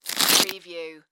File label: Flesh Rip Heavy